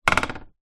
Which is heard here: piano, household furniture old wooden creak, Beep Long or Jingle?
household furniture old wooden creak